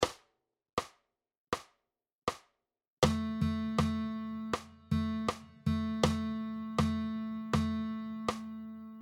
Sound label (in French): Dictées de rythme